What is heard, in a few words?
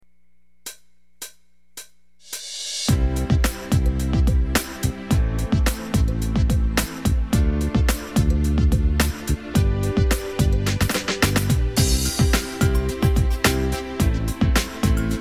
hiphop; yamaha